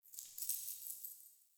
Idle Head Shake; Tagfer; Warframe; Ambience; Entrati

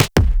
Weapon Punch Double; Warframe; Weapons; Doubles

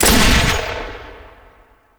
Shot; Lex Prime; Trinity Prime Boss; Warframes

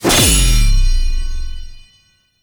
Sword Cut; Envy; Warframe; Duviri; Dioramas

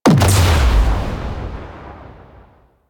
Pillar Ship Missile Explosion; Warframe; Vehicles; RailJack; Proc Ships; Corpus

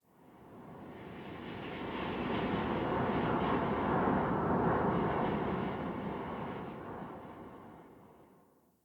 Under City Wind Heavy Slow Gust; Ambience

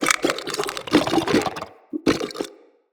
Shrimp Idle, Creatures; Vocals; Baliq Shrimp Glade

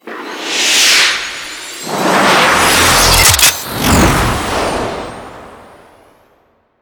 Archwing Trench Run Intro Arch Attach

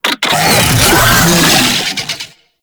Summon Vocal; Warframe; Uriel; Catenach

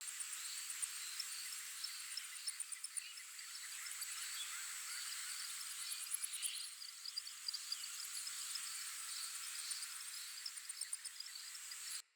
Critter Loop, Ambience, Glades

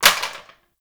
Plastic Drop; Warframe; Ambience; Nineteen Ninety Nine

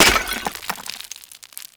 Destroy Planter Hanging

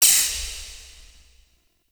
Corruption Pickup Despawn; Warframe; Missions; Zariman

